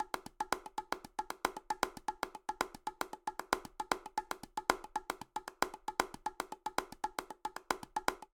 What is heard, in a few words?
Afro Jazz Bongo 115bpm; Latin Percussion; Ableton; Factory Packs